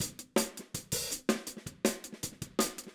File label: Hi-Hat, 162 BPM; Drums; Drums from the Big Room The Mixes; Take Your Toms Off; Verse